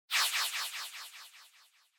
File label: Motion Agility High Delay